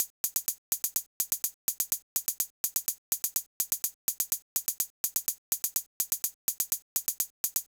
Pepper Drum Loop - 125 BPM Hihat; Cymatics - ANALOG EVOLUTION Beta

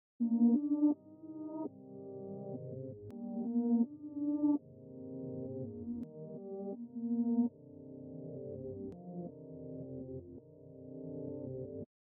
165 BPM C Min Keys Slow, Reverse; Loop Stems; Cymatics; 2022 Melody Collection; Fear And Greed

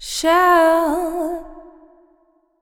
Shell, Female Vocals Wet; Advent Calendar 2017 Day 1

